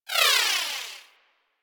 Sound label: Pet Skip Rope Despawn; Warframe; Moa; Tenacious